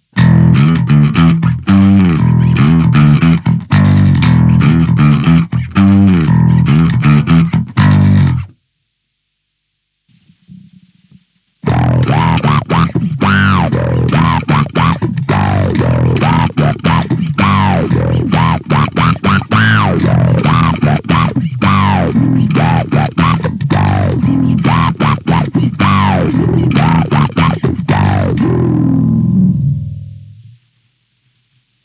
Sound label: octafilter filter